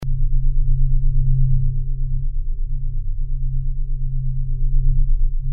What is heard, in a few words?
Mech Drone